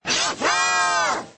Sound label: mouse chorus